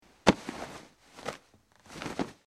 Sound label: Grabs Cloth Movement